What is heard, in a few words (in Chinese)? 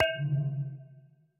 末地传送门放置末影之眼声